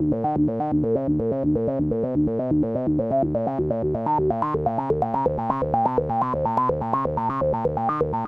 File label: 126, SYNTH SEQ; AMG; Global Trance Mission VOL-2